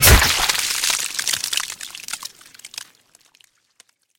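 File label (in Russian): сильная мучительная утренняя рвота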